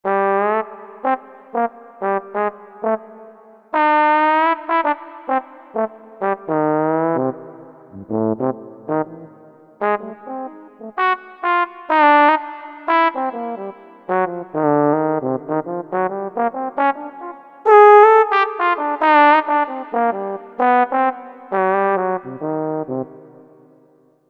Trombender, Korg MSC-11S Brass

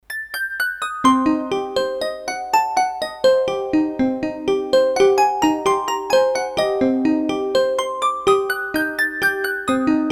Crystal Celesta, Roland D-10; Factory Patches

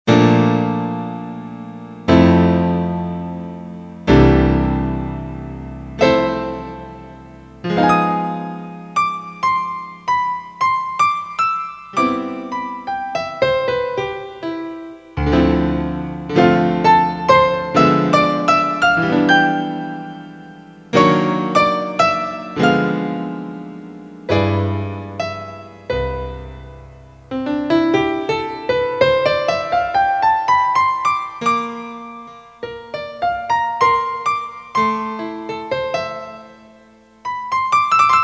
Casio CTK-601, Tones, Grand Piano

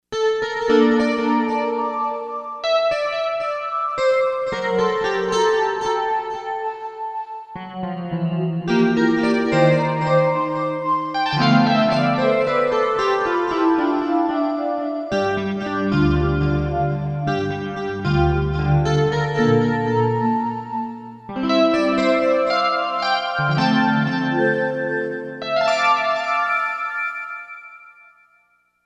Canonisms, Korg MSC-2S Synth combination; Synth 1

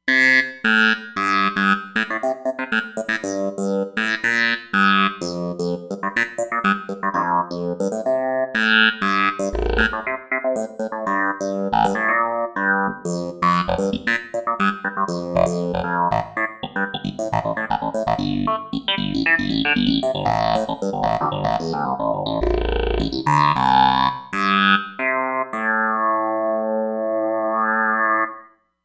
Anadroid, Roland Fantom XR

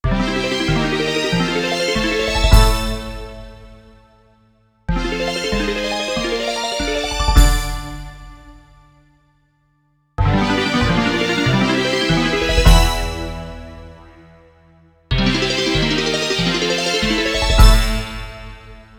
Orch Hit

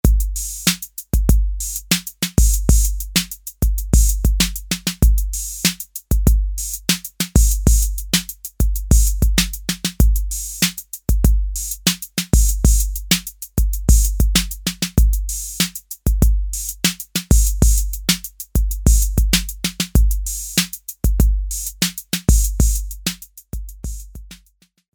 ROCK, Roland TR-808; Factory

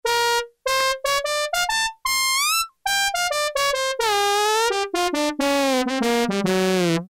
Trumpet, Yamaha TX81Z

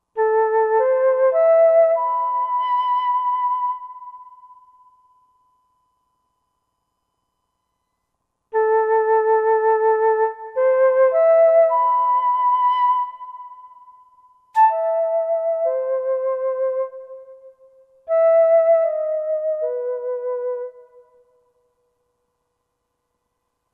Concert Flute, Roland SR-JV80-16 Orchestral II

